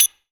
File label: FING CYM, FINGER CYMBL; AKAI S-Series CD-ROM Sound Library VOL-5